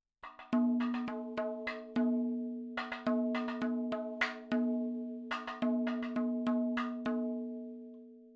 70 3 4 Mad Fills L, madhalam, percussion; Sample Banks; MADALAM 70 3 4 FILES

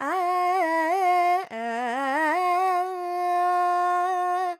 Dorian, Vocals Carnatic Female Alaps 70 G Dry

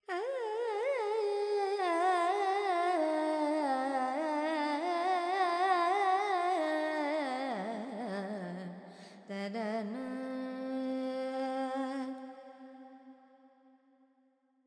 FX Purvi Kalyani, Carnatic Female Alaps; Sample Banks

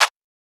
Clap, Percussion; Sample Banks

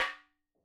madalam notes L

